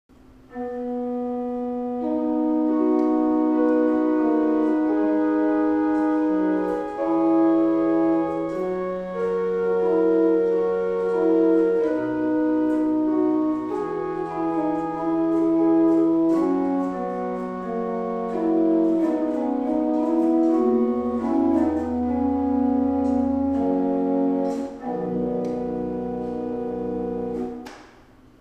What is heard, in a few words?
Grob Gedackt und Viola da gamba 8, Tannenberg Organ; Gemeinhaus Saal - Old Salem, North Carolina